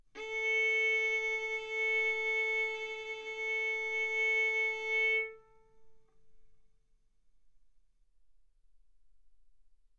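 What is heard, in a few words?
Violin arco mf A string A4 B4 stereo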